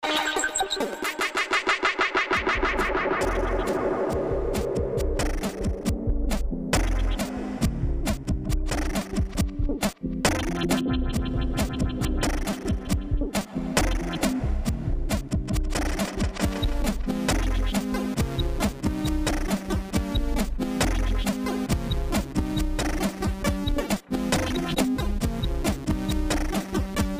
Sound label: Dub